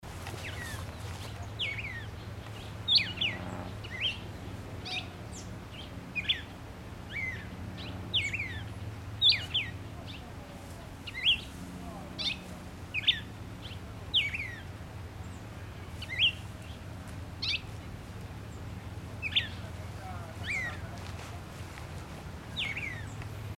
cardenal común

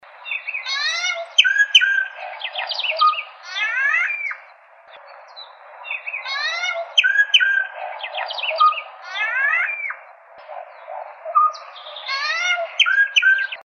voz boyero negro

